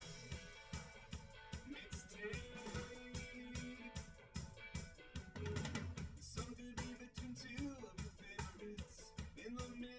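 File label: with drums live rec; SASSEC07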